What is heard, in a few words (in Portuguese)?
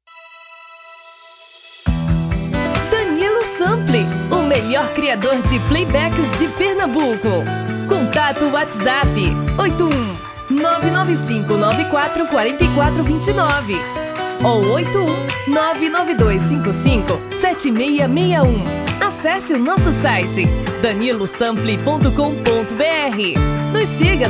Brega